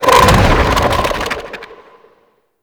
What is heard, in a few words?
Scarab Swarm Release; Warframe; Mummy